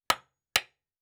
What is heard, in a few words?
Nail; Fantasy Sounds Bundle; Craft